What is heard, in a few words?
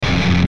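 Musical Element, Drum Snare March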